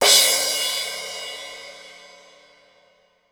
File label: CYM CRASH, DRUMS; USB Soundscan vol.03 - Pure Electro; AKAI